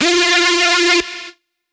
Lead - F

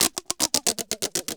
TAPE VARIA; Rarefaction; Poke in The Ear With A Sharp Stick

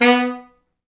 B2, Pop Brass; Best Service ProSamples vol.19; AKAI

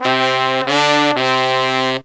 92 bpm, horns; 90 s Sample CDs; Zero-G; Phantom Horns

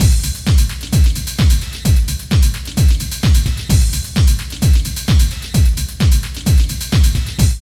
LOOP, Tekno Industrial; East West

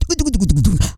SUPER FILL, Vocal Planet Blues Soul; Spectrasonics

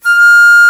Flute mf F4; Digital Sound Factory Virtuoso

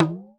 Squeezed, Alesis D4

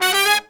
Pop & Funk Brass

